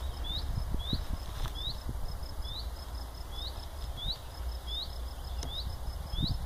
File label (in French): Pouillot